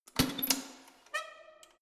letterbox metal unlock open pull hard

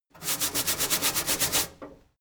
mechanic sink brush long

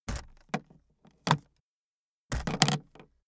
aluminium case handling

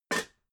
pots stack soft

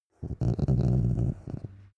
dog small growl very soft

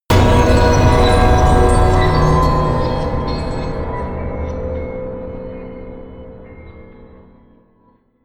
distorted bell high

